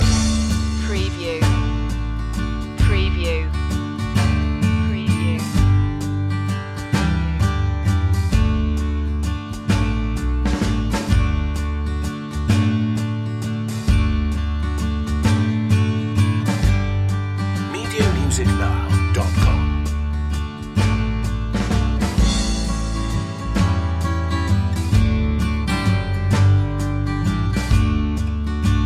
goodnight waltz loop

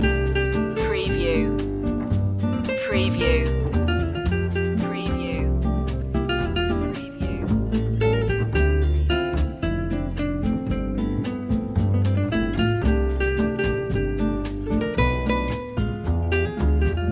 loop

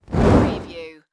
Torch Pass By Whoosh

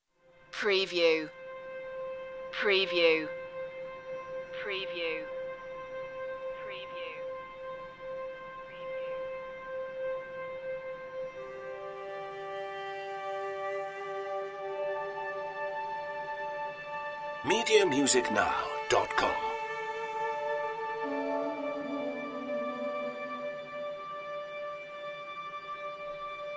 red string d ambient

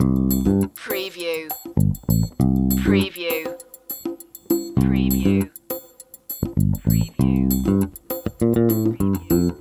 beat vouyer loop

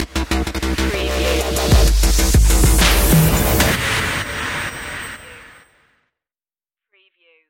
dubstep stinger

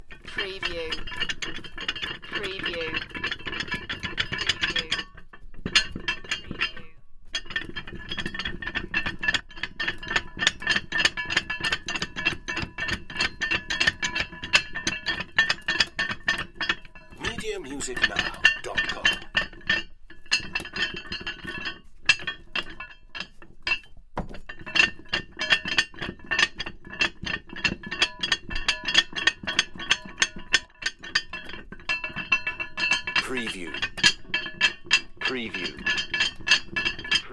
Heavy Chain Rattling On Winch Very Long Multiple

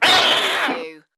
Cat Screech Hardcore

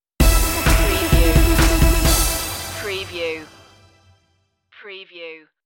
tv stinger

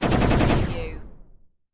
Laser Machine Gun; Sound Effects Bible

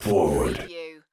forward

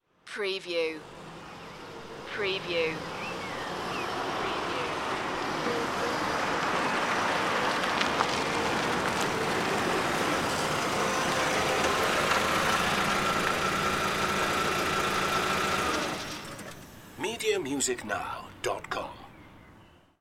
car approaching

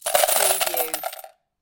Slot Machine Win - Coins Falling Into Payout Slot

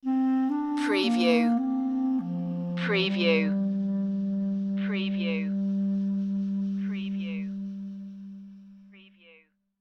lone clarinet sting

